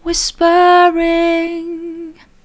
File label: whispering soft